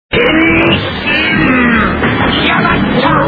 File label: transform